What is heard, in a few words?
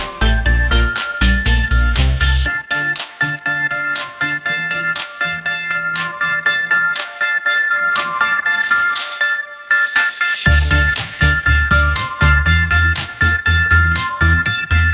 upbeat pop melody